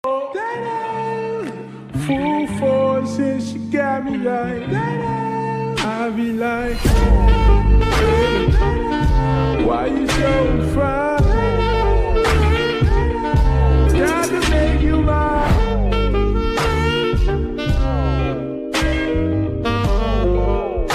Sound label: Instrumental Ringtone